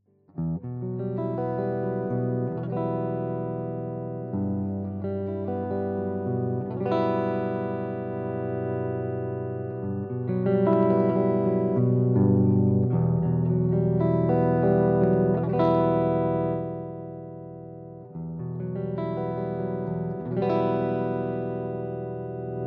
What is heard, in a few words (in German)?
Clean Alle Pickupeinstellungen